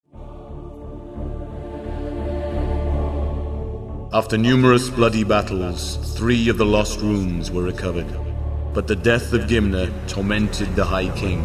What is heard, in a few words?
Mountain Clan Win; Disciples II Dark Prophecy Gold Edition